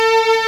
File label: Ending Credits, SNES; Super Bomberman 4